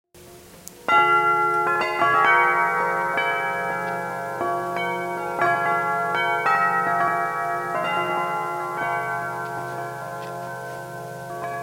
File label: Bells Bells Bells, chime